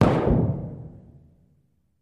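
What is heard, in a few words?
2 Oz Black Powder Bomb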